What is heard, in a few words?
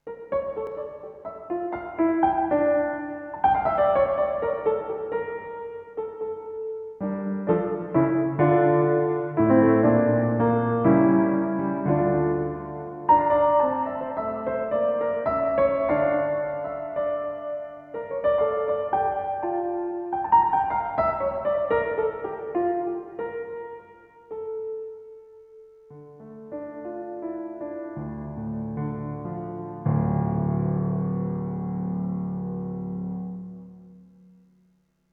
CP1 Room